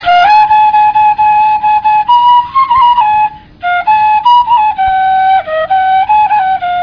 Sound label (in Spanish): pifano